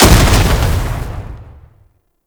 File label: Tank Cannon Projectile Explosion; Enemies; Weapons; Lasrian Tank; Warframe